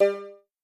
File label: error